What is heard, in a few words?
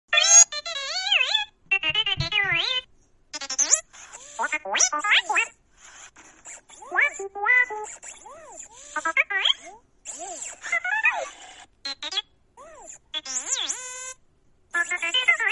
bb 8 sound